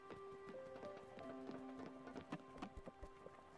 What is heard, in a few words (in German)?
schritte; spiritfarer